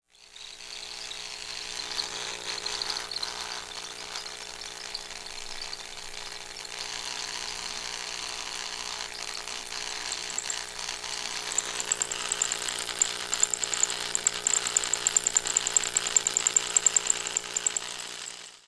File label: Drill Idle